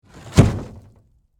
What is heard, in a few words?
Impact Wood Object, Fall, Over, Heavy; Materials; Blast Wave